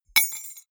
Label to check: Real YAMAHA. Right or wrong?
Wrong